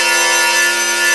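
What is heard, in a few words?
A4 air bell; Northstar; The Wizard VOL-1; GLASSOMA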